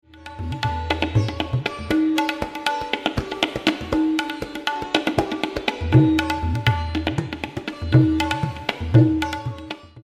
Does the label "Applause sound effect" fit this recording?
No